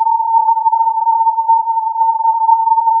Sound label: filtered input